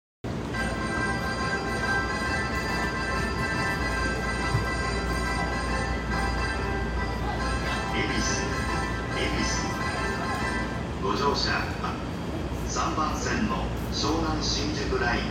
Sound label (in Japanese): JR湘南新宿線恵比寿北